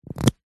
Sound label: household lip balm tube lid remove